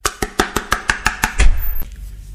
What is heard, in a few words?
Knock; Mesa